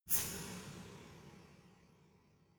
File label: resonator hit double soft